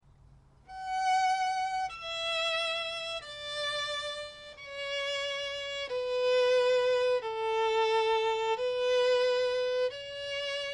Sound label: canon duet, violin; pachelbel